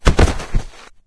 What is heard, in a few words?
body drop; Items handling